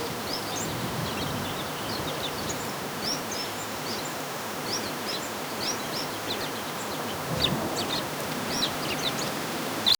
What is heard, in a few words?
morning birds chirping, rural